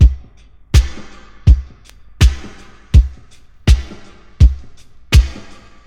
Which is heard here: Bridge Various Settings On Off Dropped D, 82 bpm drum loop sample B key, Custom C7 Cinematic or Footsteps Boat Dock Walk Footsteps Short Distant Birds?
82 bpm drum loop sample B key